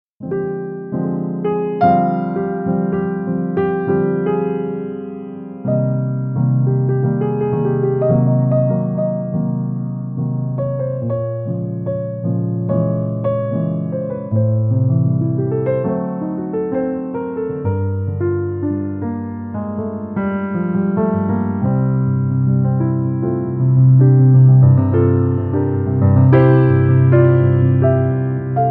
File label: Smooth Blues Piano